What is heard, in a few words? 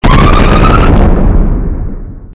invaders ship hit